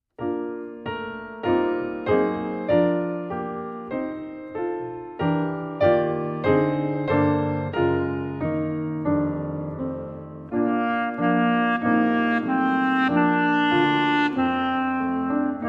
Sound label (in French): au clair de la lune klarinette klavier; froehliche klarinette